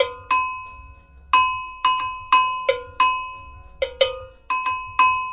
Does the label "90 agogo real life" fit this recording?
Yes